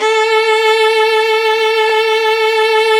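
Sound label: VIOLIN VIBRATO; Sound & Vision; Gigapack I CD 2; Violins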